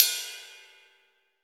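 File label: ambient ride, GM drum set; AKAI S-Series CD-ROM Sound Library VOL-8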